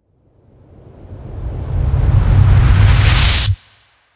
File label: riser; hyperframes